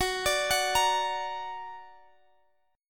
Gb Augmented Guitar Drop D

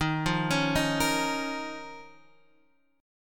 F 7th Suspended 4th Sharp 5th; 11,8,11,8,11,x

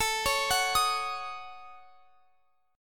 A 6th Flat 5th, Guitar, Open F; A6b5 arpeggiated; x,x,21,20,21,22

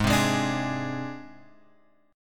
G sharp Major Flat 5th, chord; G#Mb5 Chord {4 3 6 X 3 4} strummed

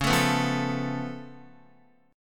Db Augmented 7th Db down Guitar; strummed; JGuitar

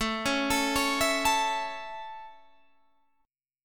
A Major A

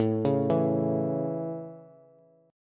A Major, Bass; arpeggiated; JGuitar; x,12,11,9

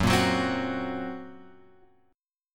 F sharp Major Flat 5th F sharp; F#Mb5 Chord {2 1 4 X 1 2} strummed

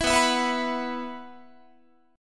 B Suspended 4th, Guitar; Bsus4; strummed

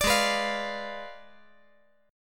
G sharp Diminished 7th G sharp

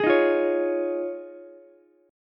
D sharp 7th Suspended 2nd Suspended 4th, Ukulele; D#7sus2sus4 strummed; JGuitar

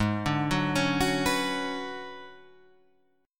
B Minor 6th Add 9th Ab

